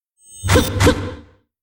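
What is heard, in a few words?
meerking attack, monster; Senillinea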